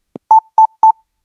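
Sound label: iphone message notification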